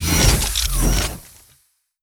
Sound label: SFX open laser; through abandoned 2 the forest 2016 gamerip pc